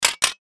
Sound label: Click Double Metallic; Clicks (Sound Effects:Multimedia)